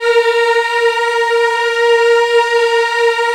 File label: A#3 WOM EH, Choir; WOMEN; USB Soundscan vol.28; Acoustic & Synth; AKAI